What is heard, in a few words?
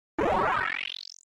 Digital Chirp Wash, computers